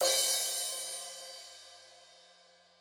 Big Room Single Cymbal Hit D Key, Royality free cymbal drum sample tuned to the D note